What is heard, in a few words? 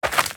Jump Dirt Jump